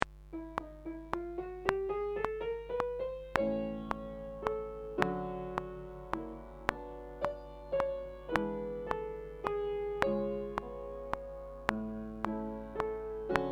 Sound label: SATB Klavier; Hiller; SAUL